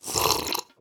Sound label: drink milk; mob; minecraft; wandering trader